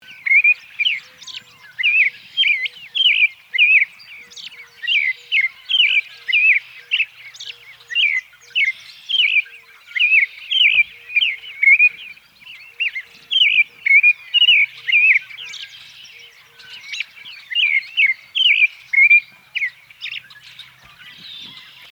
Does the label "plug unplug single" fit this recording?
No